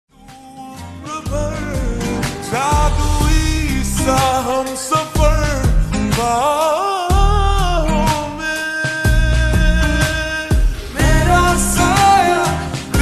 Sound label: Slowed Reverb Ringtone